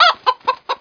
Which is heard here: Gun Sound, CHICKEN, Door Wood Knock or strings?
CHICKEN